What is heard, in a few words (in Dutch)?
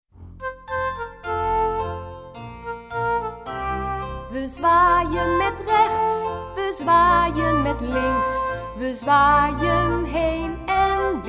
Zwaailiedje; tribi skirbi kleuter